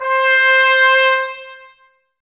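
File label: BRS trumpets C; 90 s Sample CDs; Miroslav Vitous; Woodwind Brass Ensemble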